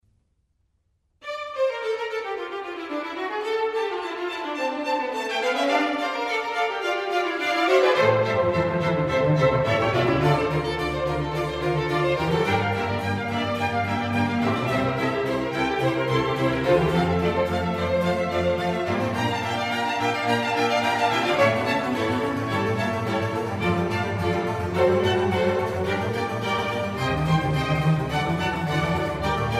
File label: Concerto for Strings in D minor; RV128; Antonio Vivaldi